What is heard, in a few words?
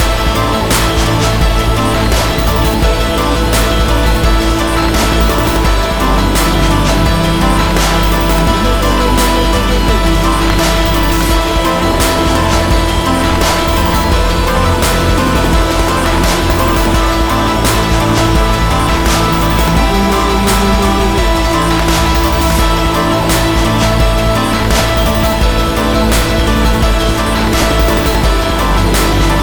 Boss Wavefight; rive wreck hack die retry; ps4